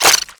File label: NPC Killed